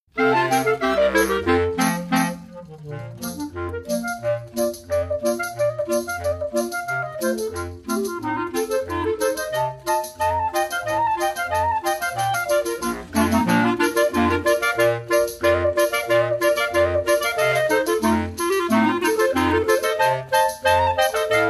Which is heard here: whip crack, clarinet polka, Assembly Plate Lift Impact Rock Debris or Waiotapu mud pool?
clarinet polka